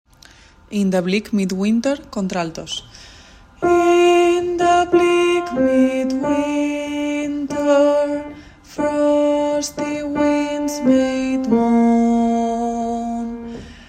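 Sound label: midwinter alto; In the bleak midwinter; Christina Rosetti y Gustav Theodore Holst; Navidad JOCSMAB 2023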